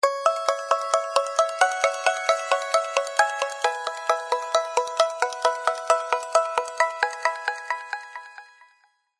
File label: picking awesome tone ringtone